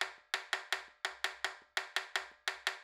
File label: Acoustic Percussion